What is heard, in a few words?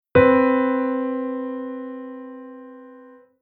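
Chord Interval Major 2nd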